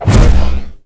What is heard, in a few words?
health